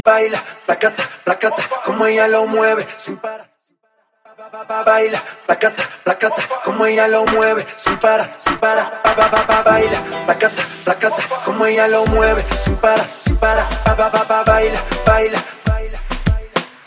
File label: Top40 Latino Mainstream Acap Into Moombah Re Drum; Julo